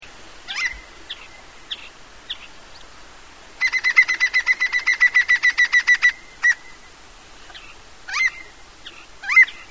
Carpintero Andino, Andean Flicker, Colaptes rupicola; cantos